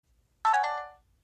Cricket Piano

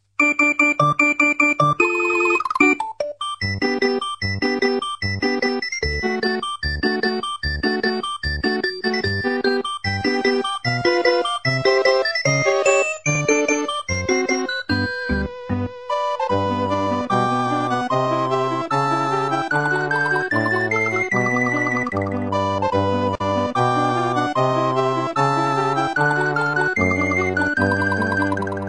video game ringtone; games; ringtones

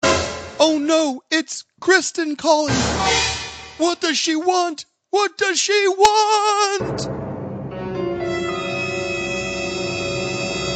Suspense

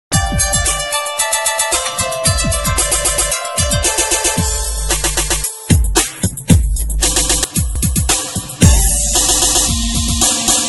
drums remix; ringtones

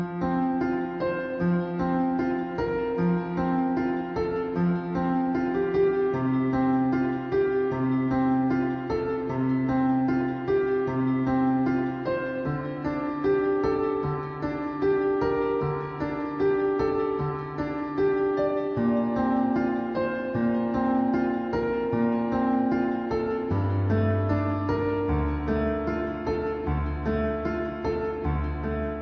romance music; ringtones

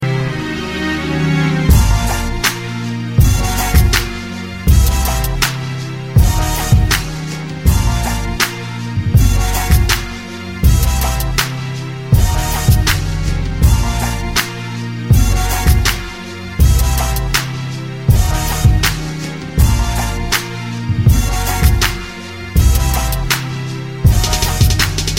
Orchestra Beat

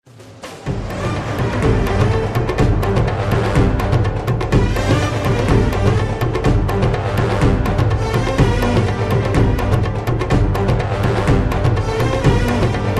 arab battle

